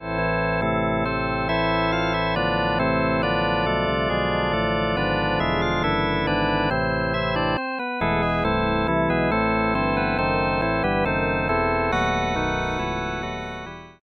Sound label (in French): orgue de la chapelle